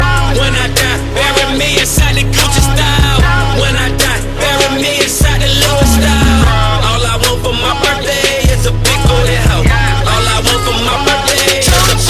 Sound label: Birthday Song